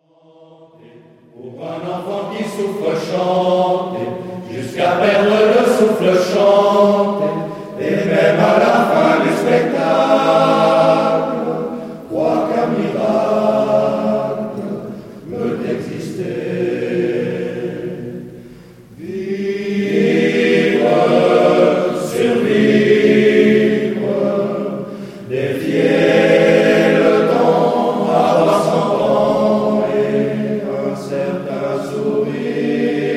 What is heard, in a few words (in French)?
chanter